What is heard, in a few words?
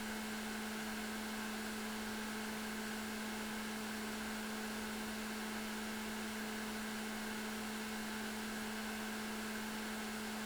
13000rpm, BDR-211JBK